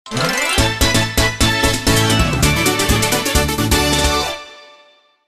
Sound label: Battle Won; PMTTYD